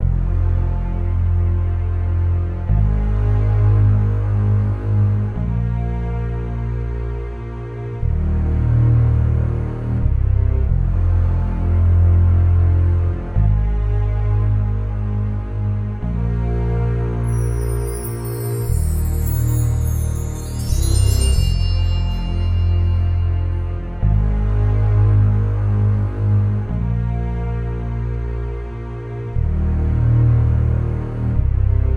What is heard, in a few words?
Bass and Cello Loop; Arrival